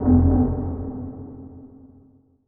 deep hit, Drum N Bass; Sonic Foundry (Sony Creative Software); Knutrix Drum & Bass

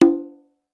Percussion, House

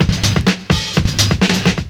FILLS, 70_s Breakbeats

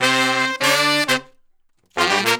Horn Section

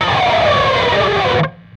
PRS SCRAP, Guitars; PRS SCRAPES

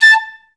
STAC A5, Advanced Orchestra; Best Service; Peter Siedlaczek's Advanced Orchestra

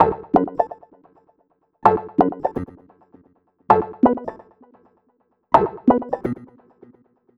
PERC, Hip-Hop & R&B; USB Soundscan vol.47; AKAI

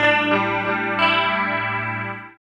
GUIT; East West - Phat & Phunky

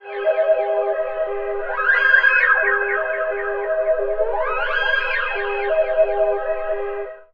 WARNING; ILIO; Trance Fusion

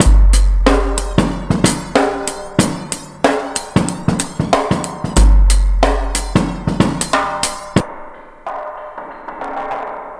DRM, Breakbeat

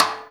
STICKS, Percussion; InVision Interactive; Percussion Slam

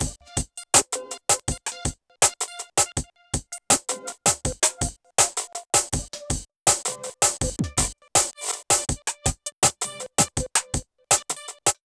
Melody Red, Synchro Funk, Beats; Sonic Foundry (Sony Creative Software)

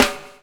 BUBBLE; Spectrasonics - Backbeat

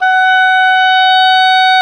OBOE, mf f; Roland L-CDX-03 Disk 1; Oboe 2&3

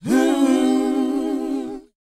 WHOA A#, Vocal R&B & Dance; ILIO; Vocal Planet

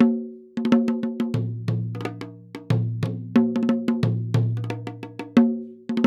PERCS 90BPM; INIS - Opium

